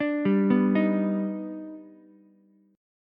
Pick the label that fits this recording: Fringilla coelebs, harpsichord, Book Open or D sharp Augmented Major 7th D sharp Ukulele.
D sharp Augmented Major 7th D sharp Ukulele